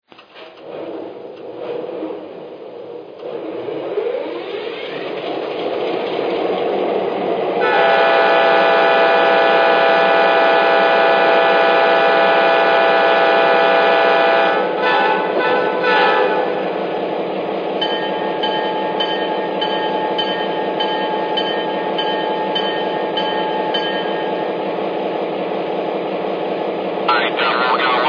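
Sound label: GP35, locomotive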